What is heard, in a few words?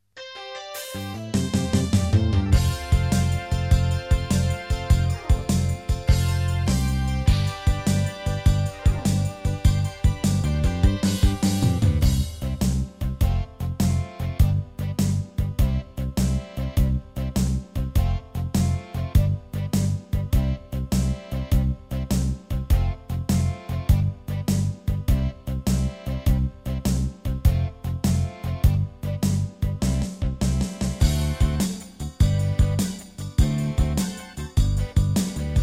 Country Gardens Backing